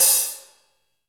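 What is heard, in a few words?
HH, hi-hat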